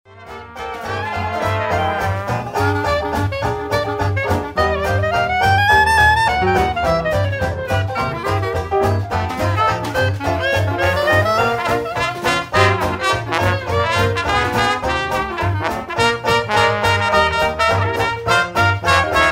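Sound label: clarinet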